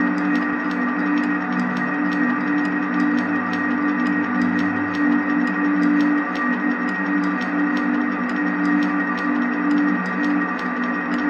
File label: Bm Marimba Drone Move; Ambient Textures; 85; Instrument Drones